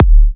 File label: D, Kicks; markus hakala